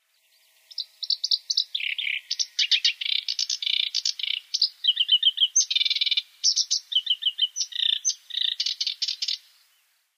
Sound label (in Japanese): nakigoe koyoshikiri